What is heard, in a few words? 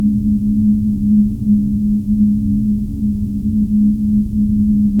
deep space hum soft breat; suit